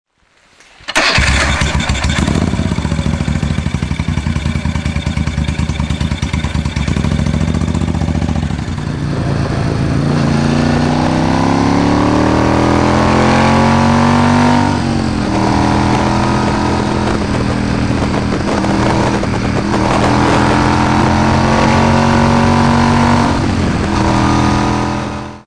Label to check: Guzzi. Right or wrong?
Right